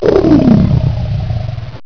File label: lion roar